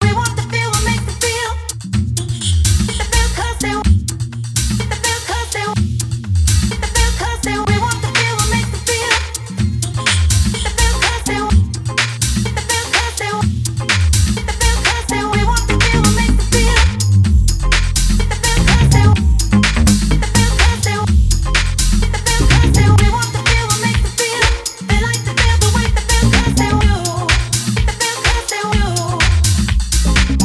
Dub

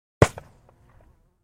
Black Powder Musket Single Shot More Ring Off; Guns & Ricochets

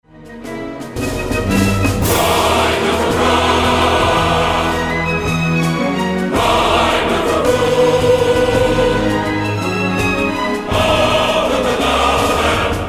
Fanfare

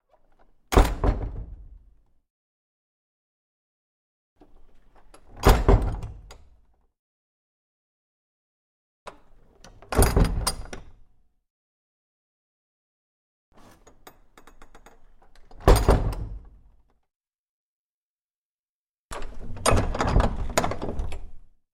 Small Wooden Door With Latch; Hollywood Edge Historical Series; cd4 Castles Palaces & Monasteries

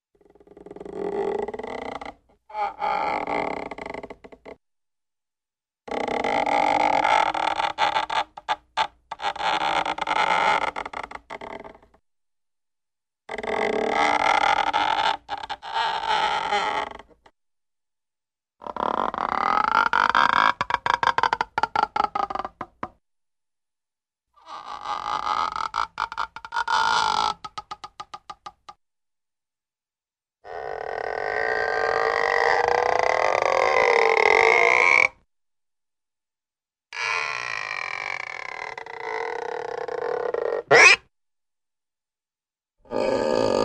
Long Creaky Stretchy Sounds (ie Slingshot); Hollywood Edge Cartoon Trax

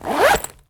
ZIPPER; TOOLS

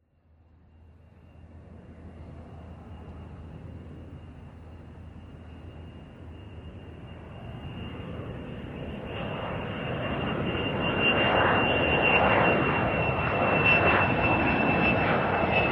U2 Touch And Go; SOUND EFFECTS1

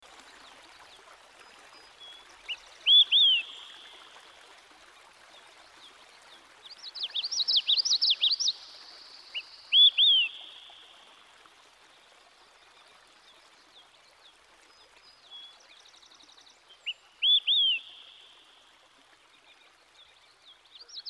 yellowthroat and olive-sided flycatcher

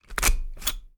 puncher, stationery

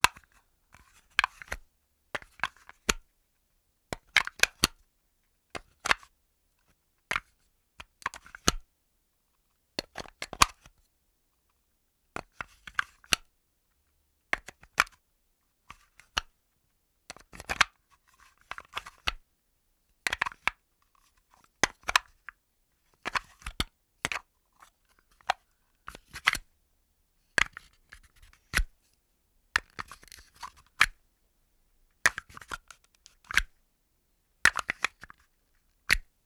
Bone Movement Dull Clacking Handling; Foley; Foley Essentials; Foley - Misc

